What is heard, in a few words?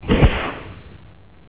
Polymorph Done; Warcraft 3